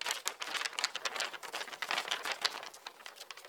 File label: Paper Airplane Fly; SFX Kit; Foley - Misc